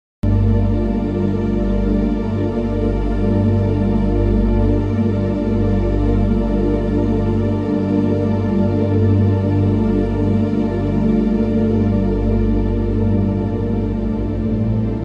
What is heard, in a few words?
Cosmic music